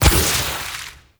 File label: Vial Ability Corrosive Shatter; Warframe; Warframes; Alchemist; Elements